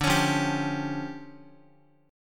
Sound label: D Minor Major 11th, down, Guitar; DmM11 strummed; JGuitar